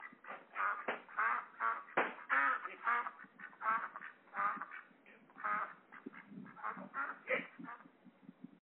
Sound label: ducks